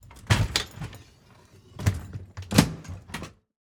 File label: Stretcher Load Into; Medical; Blastwave